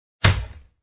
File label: sharkman attack contact up